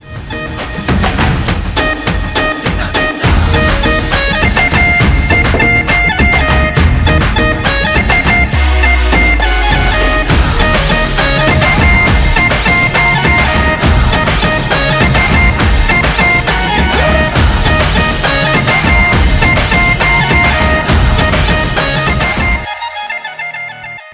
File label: Instrumental